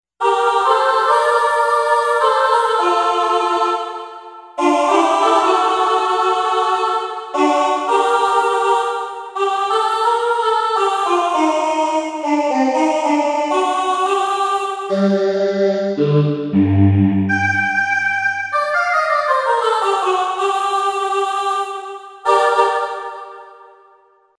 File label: choir